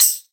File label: Airy Sizzle Closed High Hat Sound C Key; hat samples